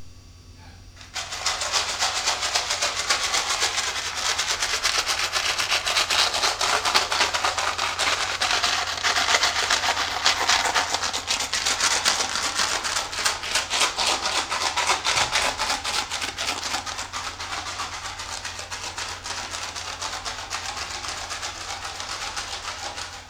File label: clips, binaural